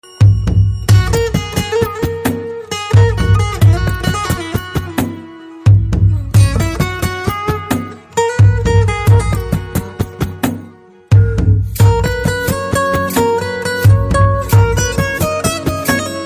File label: instrumental tone; Bollywood